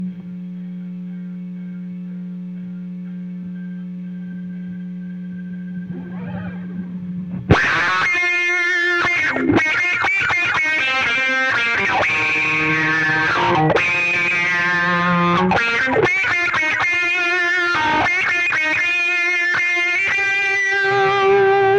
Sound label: Solo Gtr